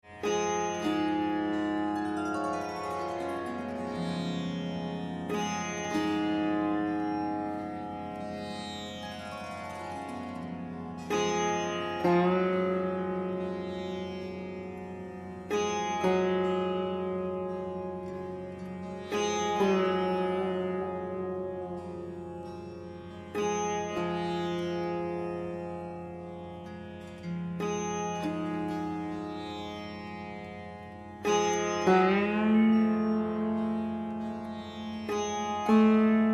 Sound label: Alap